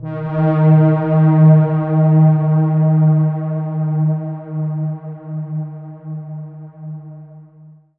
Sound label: Steampipe Darkpad C4